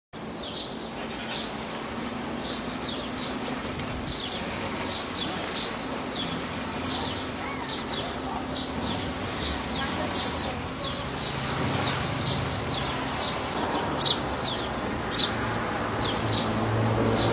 Canto do andorinhao do buriti

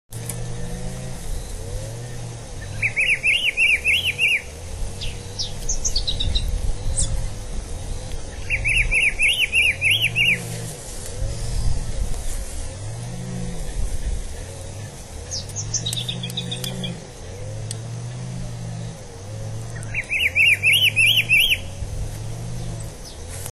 pitiguari canto

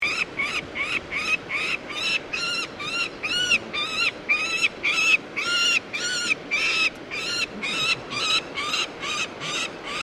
Falcão Peregrino

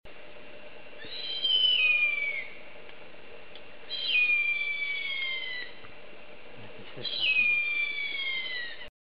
canto do gaviao real

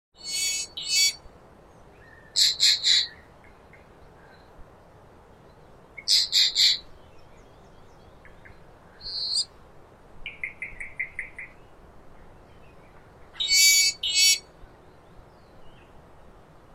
canto do gaio verde

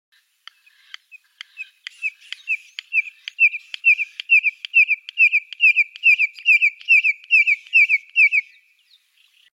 canto da abetarda de poupa

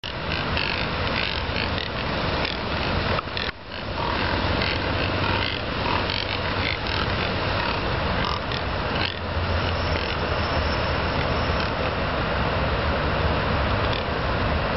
Biguás

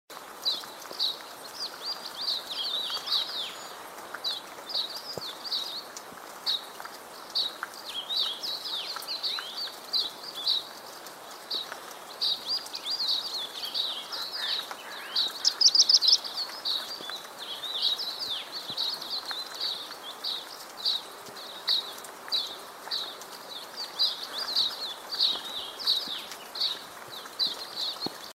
Canto do pintarroxo escuro

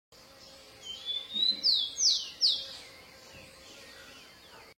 Canto do pardal de colarinho ruivo

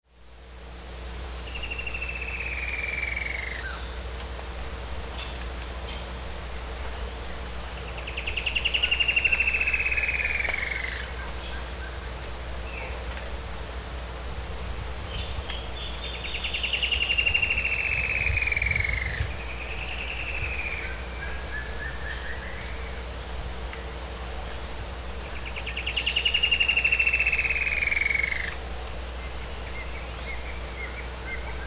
canto da choquinha da varzea

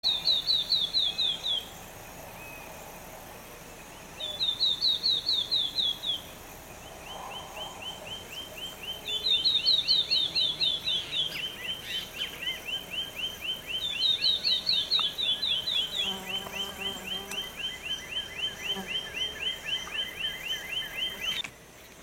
canto do balanca rabo de mascara

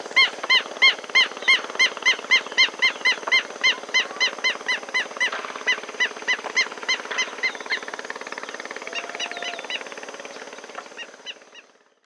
quero quero

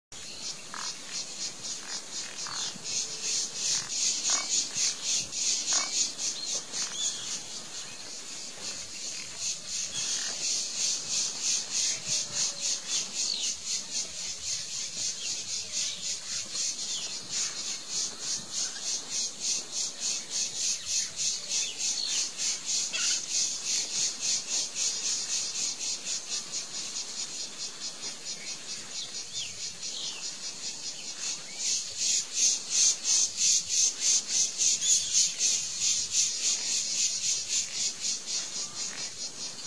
Maguari Aves em busca de alimento Aves do pantanal Aves de regioes alagadas

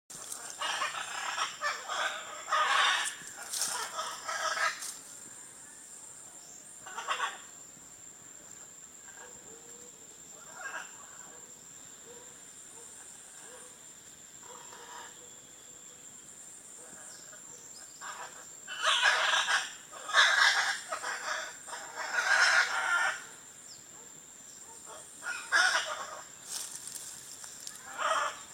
canto do araracanga

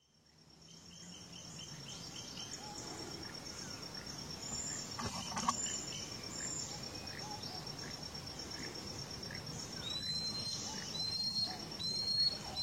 canto da macuru papa mosca

